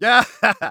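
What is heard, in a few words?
laugh short, German; Medic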